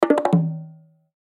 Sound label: Percussion